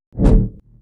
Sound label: beam knife, Weapon